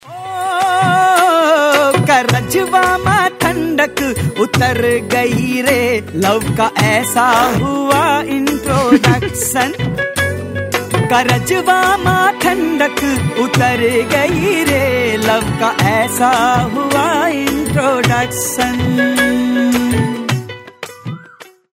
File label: Coca Cola Injection TV ad song